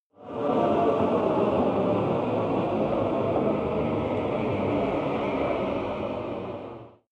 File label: demon choir cluster down